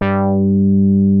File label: FAT BASS, BASS STATION; Syntec; Wall of Sounds